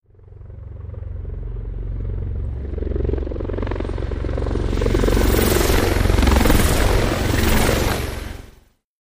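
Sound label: Apache Heli Pass Over; AH-64 Apache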